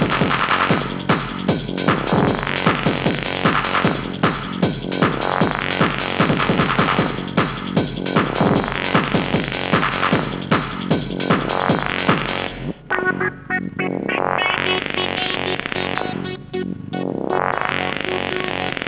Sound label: ARPEGGIATORS Trance Mission